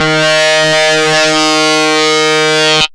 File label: HARDSYNC D#3, LEAD SYNTH; Best Service Alpha Dance vol.2